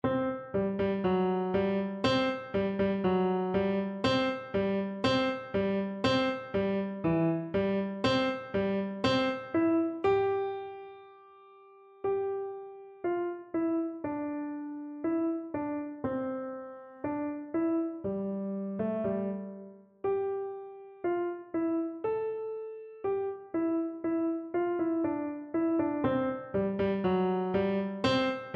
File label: march, piano